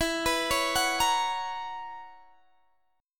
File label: B Major 7th Suspended 2nd Suspended 4th E